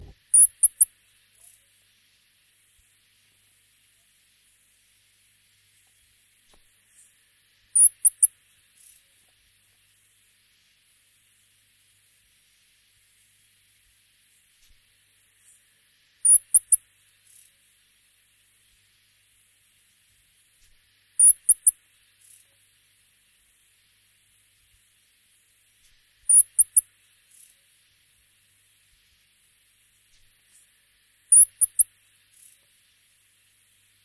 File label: Rock Wren; Pīwauwau